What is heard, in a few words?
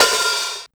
R-50 DRUMZ